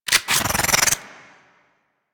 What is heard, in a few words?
Tommy Gun Reload; Weapons; Warframe; Tenno